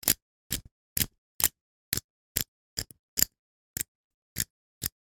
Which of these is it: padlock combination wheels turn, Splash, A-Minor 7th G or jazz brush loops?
padlock combination wheels turn